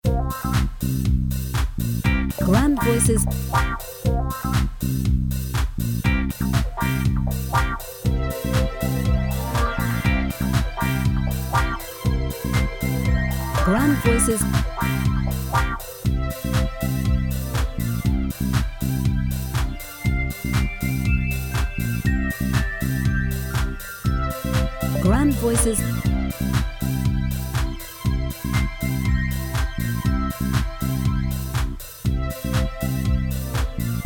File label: music happy